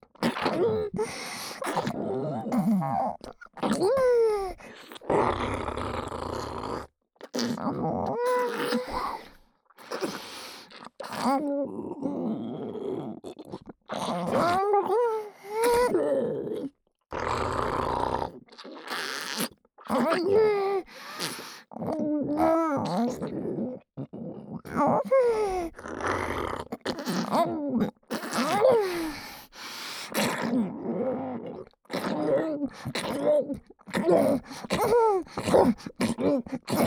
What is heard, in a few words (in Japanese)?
無垢 喉奥右耳フェラ; フェラ素材